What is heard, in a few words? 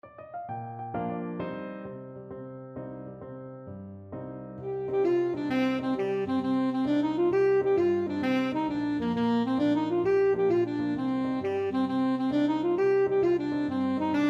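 alto saxophone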